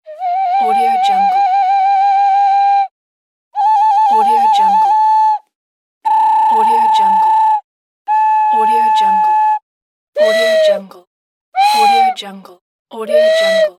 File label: ethnic whistle